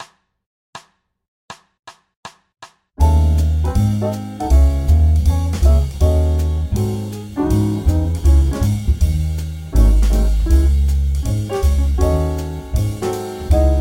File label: D#m7b5 - G#7 - C#m7 (2 bars Loop) - 160